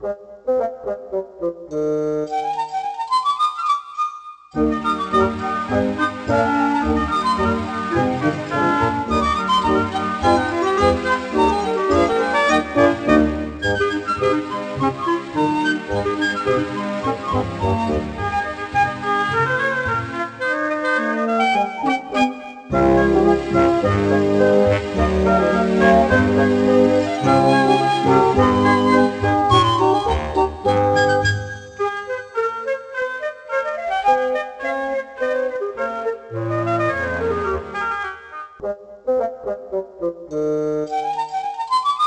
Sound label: Drumless Version; pac man world; Buccaneer Beach